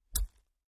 Human body parts being chopped or amputated. Mutilation, Body Dismemberment, Gore Chopping, Flesh